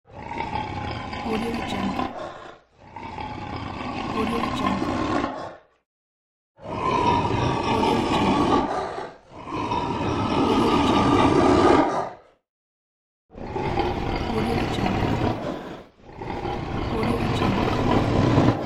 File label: crocodile